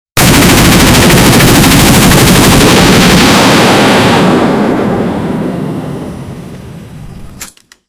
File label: gun